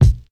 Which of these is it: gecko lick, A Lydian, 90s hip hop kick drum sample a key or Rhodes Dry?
90s hip hop kick drum sample a key